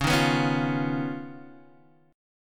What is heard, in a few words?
D sharp 7th Suspended 2nd Sharp 5th